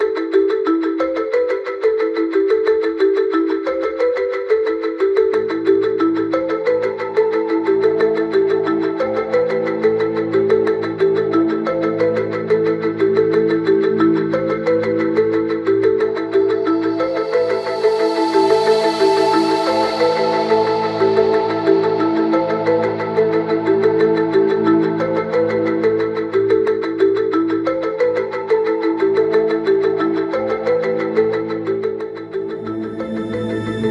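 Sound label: background music, Mystery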